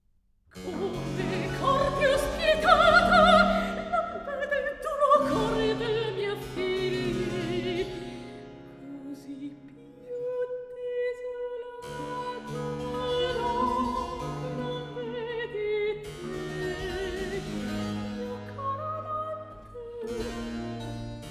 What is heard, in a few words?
Cantata, Recitativo; Rv 656 'Fonti del pianto'; Antonio Vivaldi (1678 - 1741); Vivaldi - Concerti e cantate da camera II (2004)